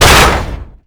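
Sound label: debris metal medium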